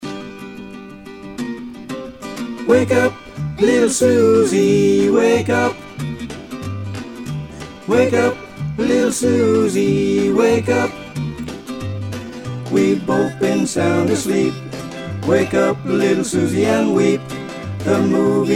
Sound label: Baritone; Choir; Harmonies